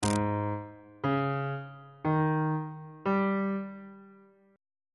g sharp major 7th suspended 4th chord intervals on bass clef